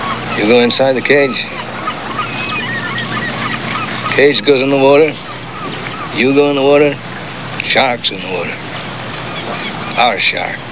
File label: cage, underwater; dod